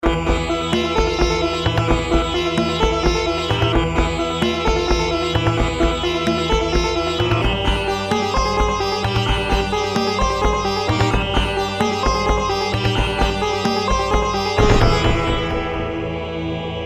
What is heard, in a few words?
Virtual Sitar plus Percussion Tabla and Choir; Syntheway